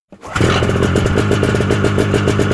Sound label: Snowmobile Start; Vehicles